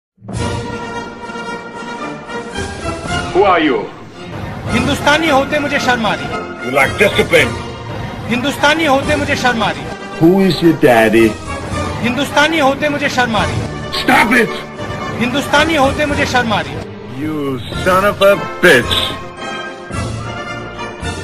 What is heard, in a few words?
best italian tune ringtone; rahul gandhi